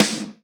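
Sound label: snare drum Hard Plate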